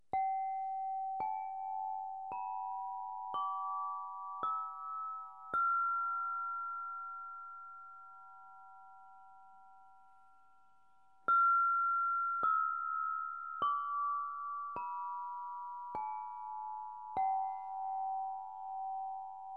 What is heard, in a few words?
Throat scale, harfa krysztalowa; Lekka harfa krysztalowa; Czakra gardla G